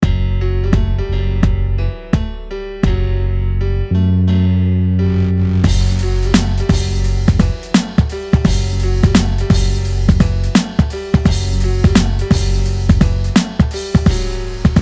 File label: Instrumental